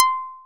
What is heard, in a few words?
plucked, programmable speaker